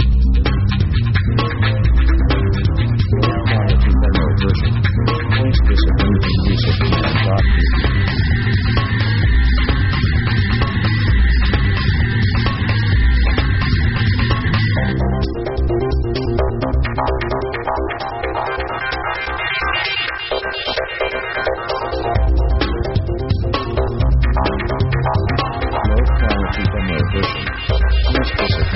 fuzz action

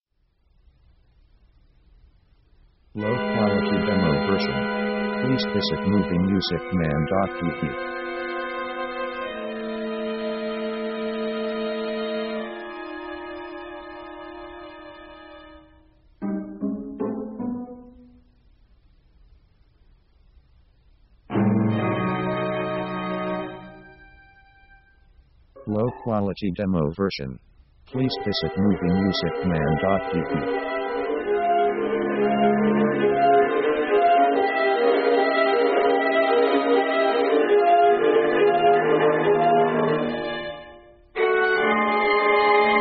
Chamber Orc

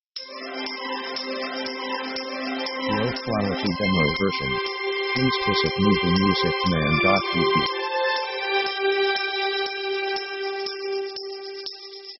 orchestra run cheerful; orchestra themes

